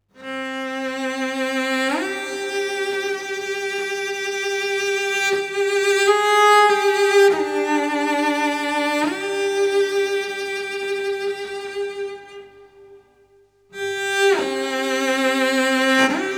Variation (Cello Melody); max payne 2 original soundtrack game rip

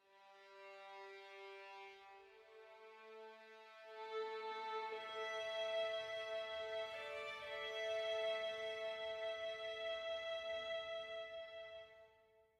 ambiant; Quivr; PS4 Windows gamerip 2016

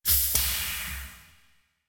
Air realse

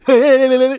clown taunt; Clown Dodger

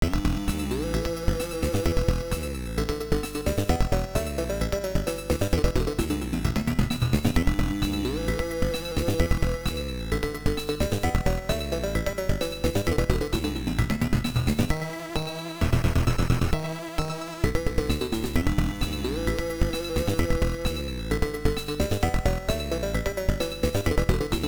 Decadence Beat; SD Snatcher; MSX2

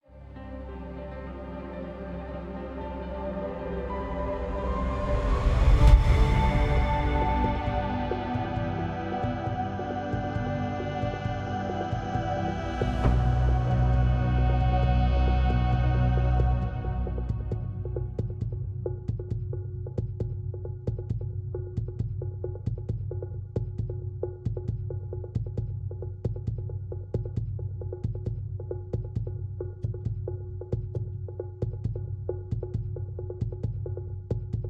Desert Music, Falling Swoop Remix

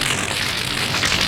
heavy crespy Loop Fire

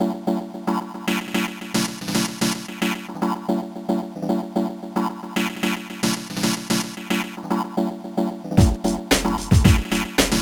Desert; Pinky and the Brain The Master Plan; GBA

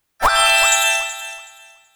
Rain-Win

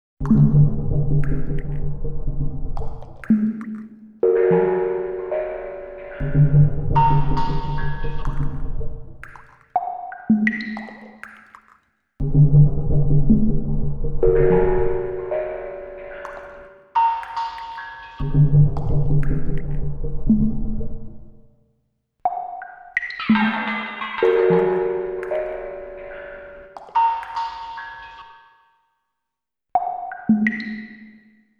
Frog Cave

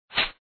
Blowdart

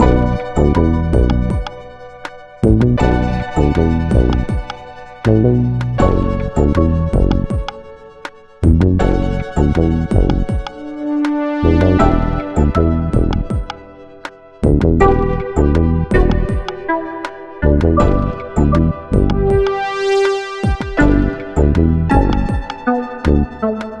stem bridge; Pix the Cat

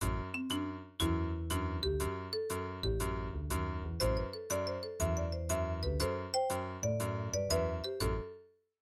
MIDI Animation stings; Carmen Sandiego Junior Detective Edition

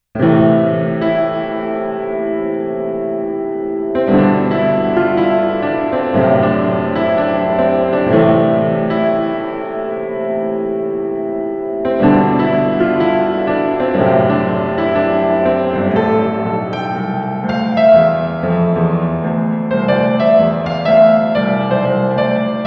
Spooky Jazz Piano

